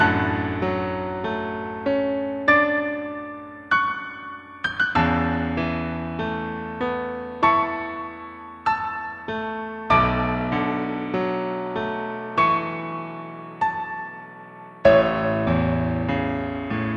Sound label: Anthem hall